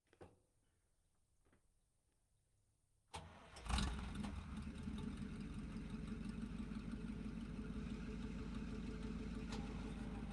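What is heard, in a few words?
Stuart M5A1 exterior slow start away by reverse engine dies; Sonniss GDC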